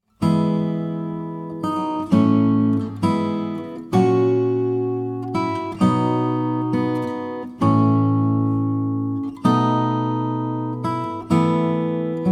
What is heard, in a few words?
Soothing Acoustic Guitar Welsh